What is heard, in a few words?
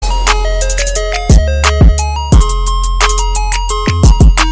ice cream truck song remix